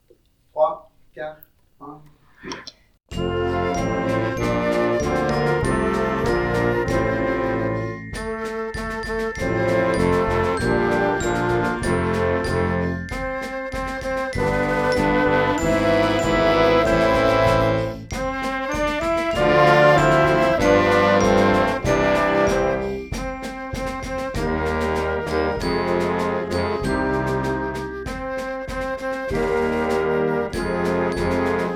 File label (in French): quand il neige noire a 96